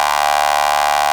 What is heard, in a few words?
RAVE BEEP; Best Service Alpha Dance vol.2